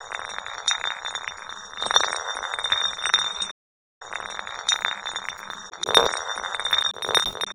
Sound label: Resynth Glass Roll